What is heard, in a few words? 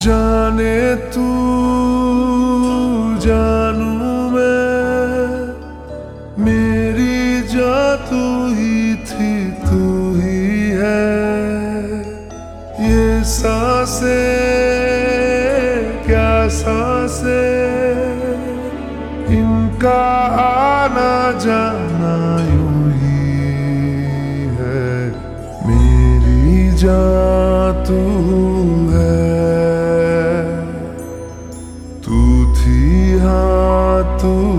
Ringtone Slowed Reverb; chhaava